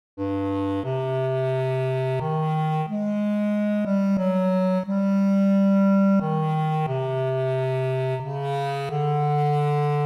bass clarinet audition

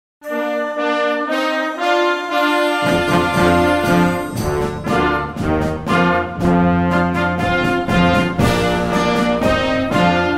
fanfare on ode to joy, Bb

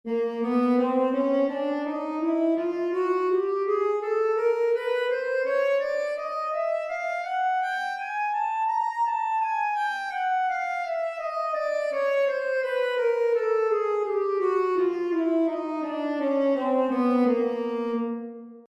chromatic scale tenor sax

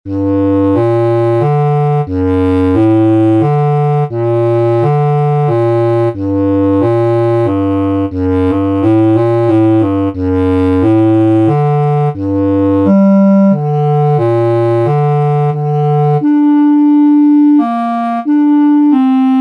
bass clar lyrical; all state

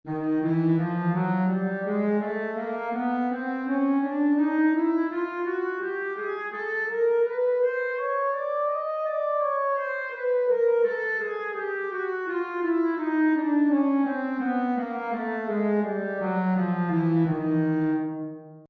chromatic scale bari sax

